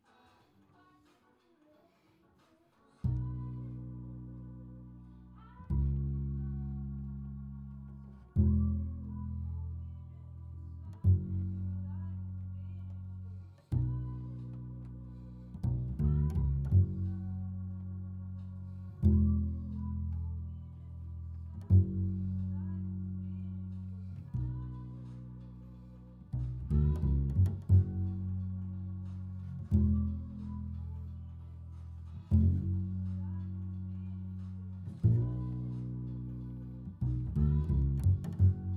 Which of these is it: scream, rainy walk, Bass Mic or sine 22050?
Bass Mic